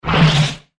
Sci Fi Whoosh, Sci Fi Whoosh Evaporation Disintegration